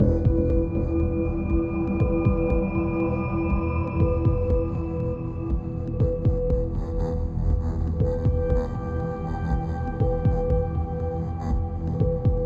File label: Alerting Information Loop; Metal Unit